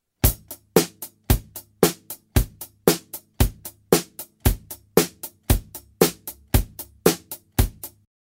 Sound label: All drums